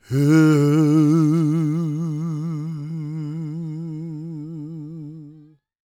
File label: GOSP MALE, SOLO; Vocal Planet Vol1 Gospel